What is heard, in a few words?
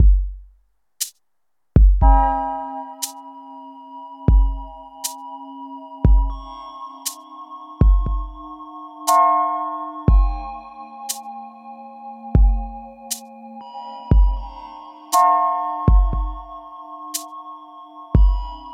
Elevator Theme; Intro Tower; splatoon